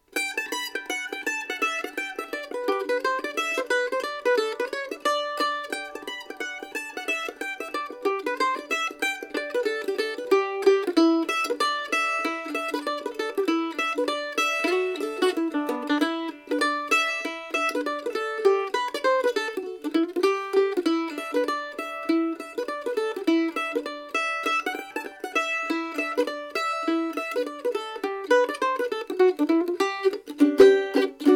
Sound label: Mandolin A